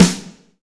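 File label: JUICEY snare, drums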